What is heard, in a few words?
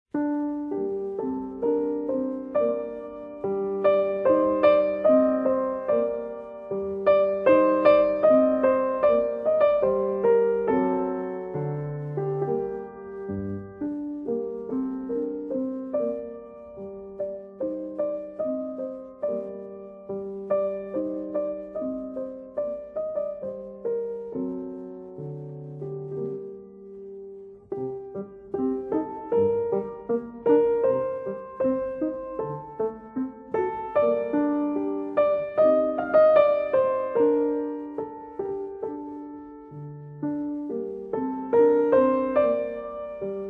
old melody